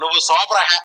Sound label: Msg Tone